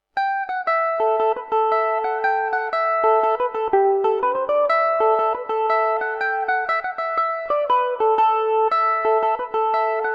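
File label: A dorian revelation, Irish mandolin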